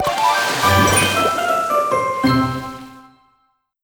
Crunchy Finish

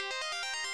climax High arp

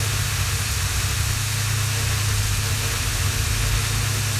Lasrbeam Plasma Loop

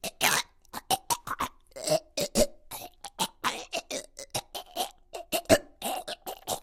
Choking; friday the 13th killer puzzle

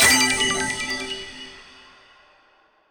ui loop end shiny

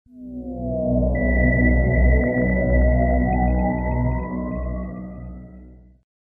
City Transition

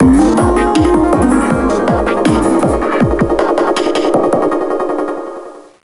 Country Fail

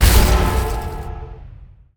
metal Jaw Footstep; Timez Attack

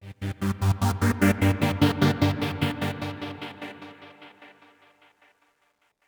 Oneshot Synth; zombie army 4 dead war; ps4 stadia switch windows xbox one

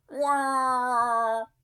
roller cry; Fung roller

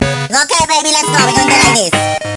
Battle Mode Stage Start

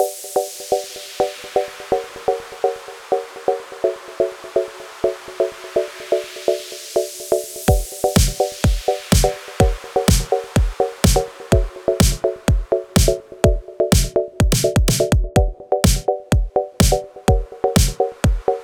Instrumental; Friday Night Funkin Neo mod gamerip

